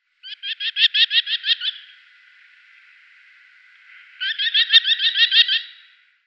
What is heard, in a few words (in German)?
Merlin Falco columbarius, Vogelstimmen